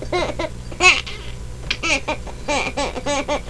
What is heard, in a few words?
laugh; baby